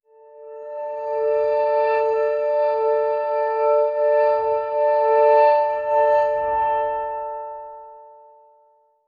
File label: subtle glass harmo; glass harmonica; xerion